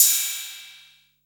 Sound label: 808 CYM, TR-808